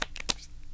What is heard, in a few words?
card open